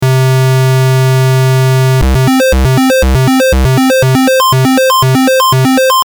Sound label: square